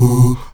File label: AHOO B 2E, Heart of Africa, STAC.OO-V; Spectrasonics